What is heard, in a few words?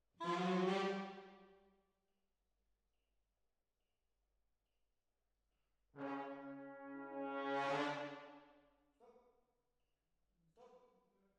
Hall, Jazz for piano